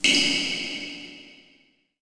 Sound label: PIPES, pipes; zones